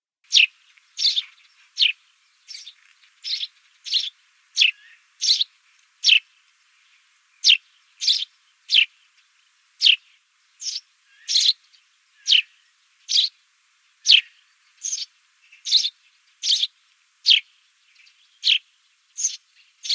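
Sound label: polevoy vorobey golos, tree sparrow voice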